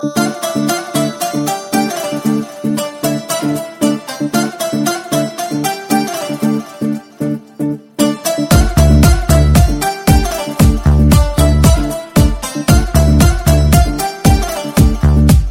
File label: beats